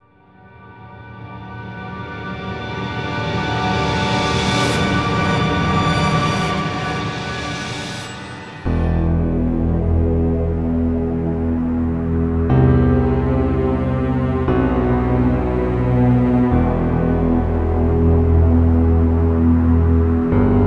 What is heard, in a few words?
gameover